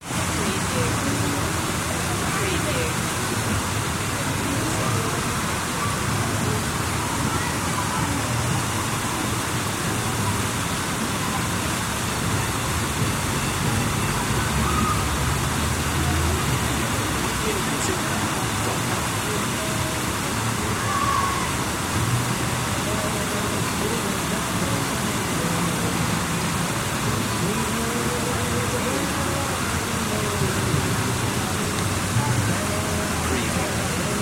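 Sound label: Pool Water Fall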